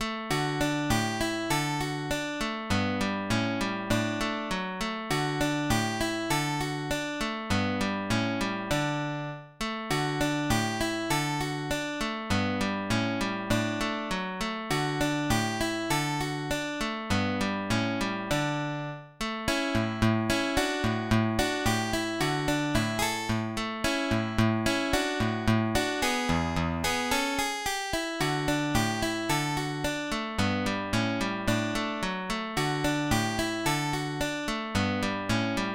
Valze Russe; Castellacci; 20 Morceaux

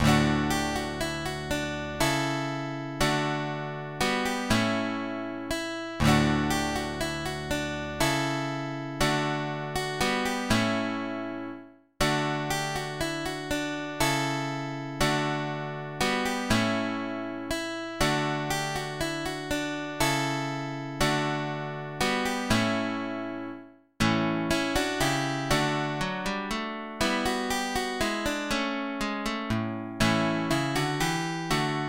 lauten suite Menuett; De Visee

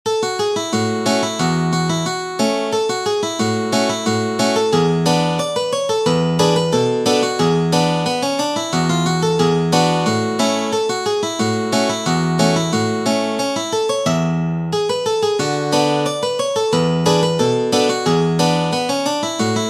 Polka, guitar

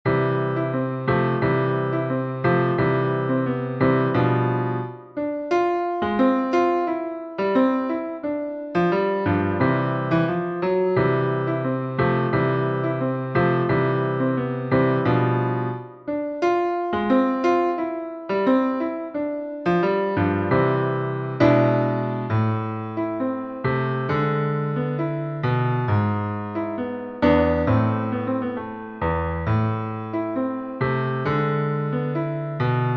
tango, guitar

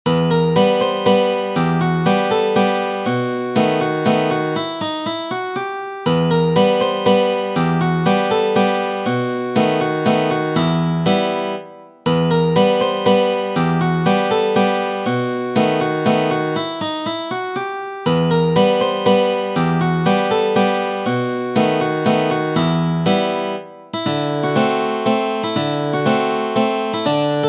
Mazurka, guitar

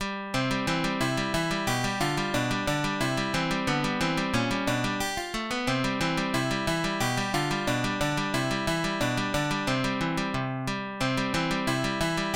Rondo; Castellacci; 20 Morceaux

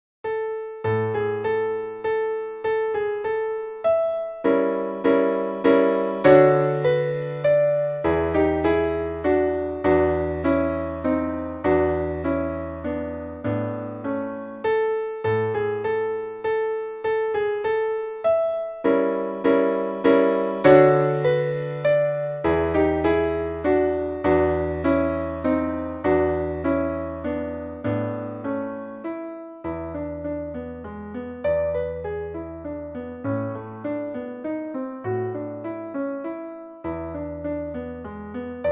waltz, guitar

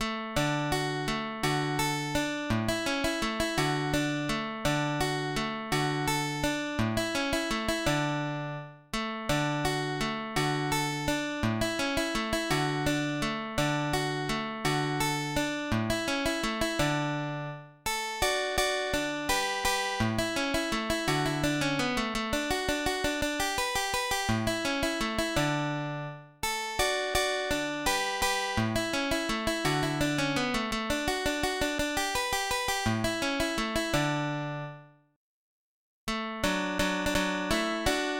Valze, Fanfare, guitar; Castellacci; 20 Morceaux

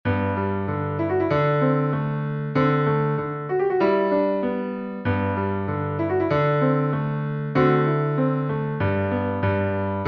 polka, guitar

